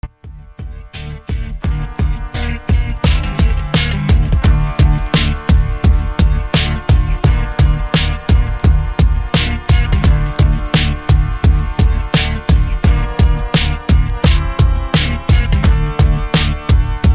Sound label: instrumental